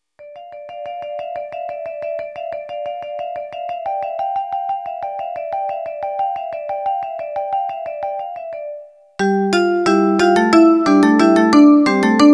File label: Duet in D Minor; juvenilia